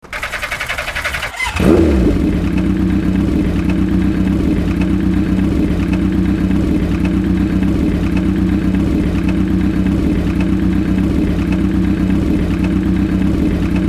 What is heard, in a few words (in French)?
Démarage moto + ralenti